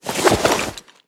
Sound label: Object Small; car simulator 2